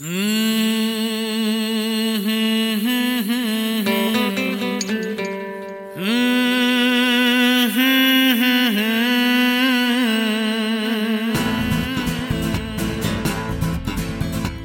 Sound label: Huhuhuhuhu